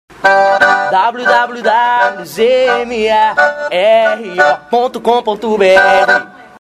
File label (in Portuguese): Vinheta cantada